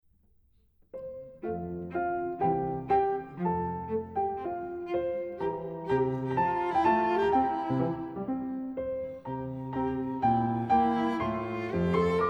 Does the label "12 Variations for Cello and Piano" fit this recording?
Yes